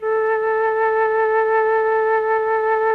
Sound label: MLTRON FLT, MELLOTRN; Sonic Reality; Vintage Timetraveler